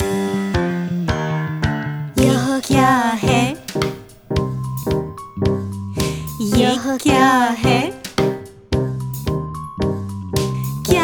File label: song hindi